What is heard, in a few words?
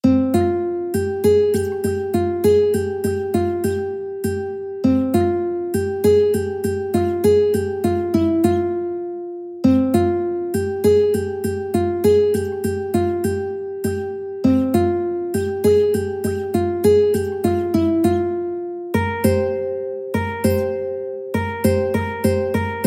Tzur Chassidi uke